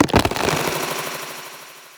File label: bramble layer; weapons; dota; dark willow